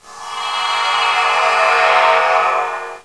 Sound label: beam